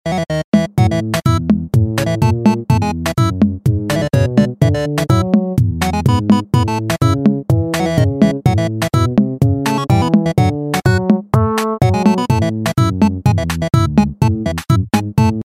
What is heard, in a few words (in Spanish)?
Danza Del Coqui, ringtones; EL MORRO